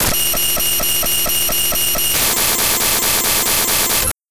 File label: Rhythm